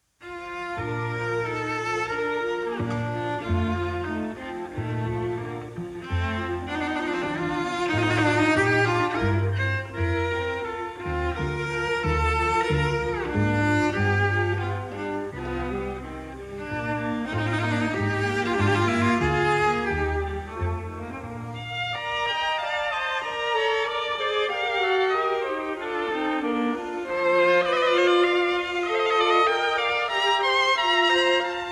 Brahms Sextet IV. Rondo Poco Allegretto e grazioso